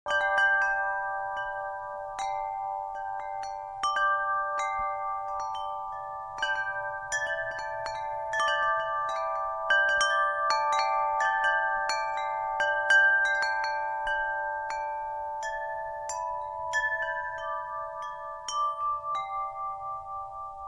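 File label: zvuki kolokolchika, small bell sounds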